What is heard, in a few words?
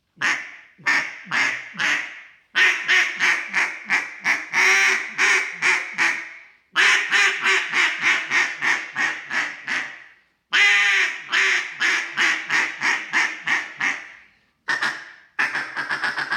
Duck Delrin; AXE-Delrin